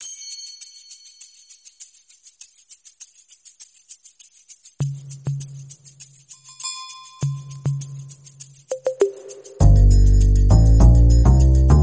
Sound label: Industrial